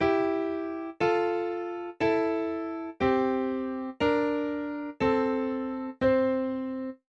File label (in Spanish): Intervalos consonantes